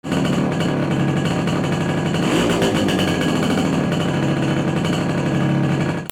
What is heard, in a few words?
VEHICLES